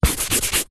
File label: office erasing rubbing out chalk blackboard